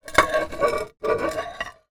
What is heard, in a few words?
glass thick large piece sharp edge scrape on other; zapsplat